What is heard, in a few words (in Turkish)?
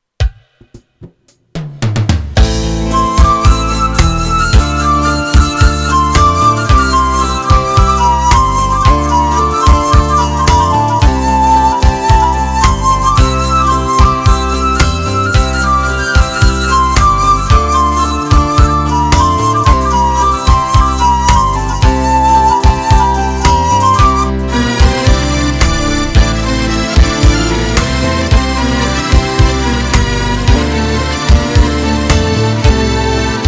saclarini taramissin; korg pa 80